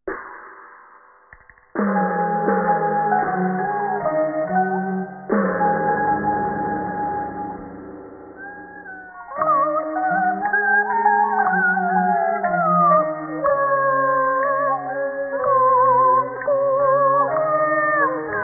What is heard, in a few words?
Music Sampling 4kHz; Music Examples